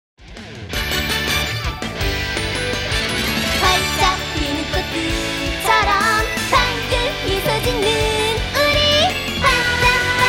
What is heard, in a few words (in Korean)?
엔딩 주제곡; 스마일 프리큐어